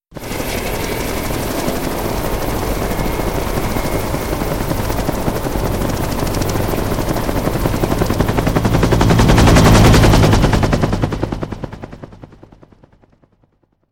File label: chopper exit; concert